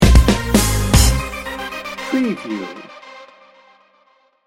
crime story sting bumper

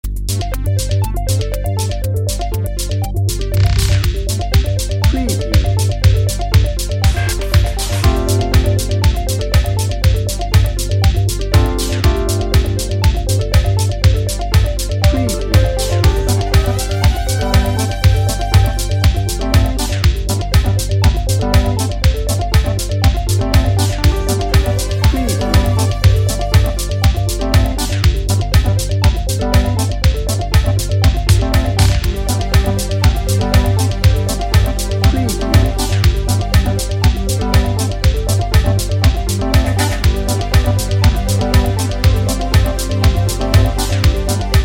technology pulse bed

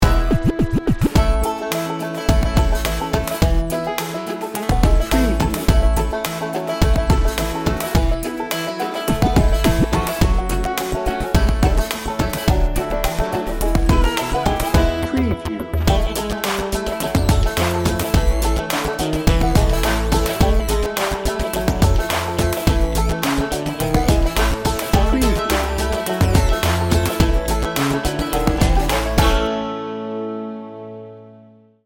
hip hop bluegrass

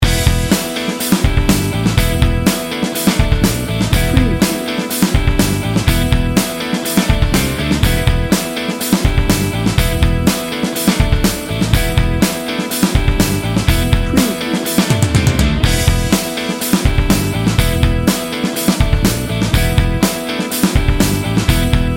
bouncy funky rock bed

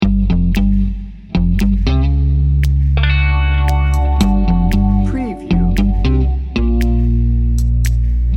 trouble ahead loop

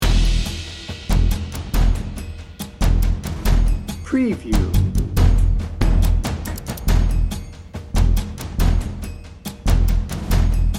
chasing the tiger dnb

